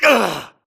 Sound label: grunt hits; Titanfall 2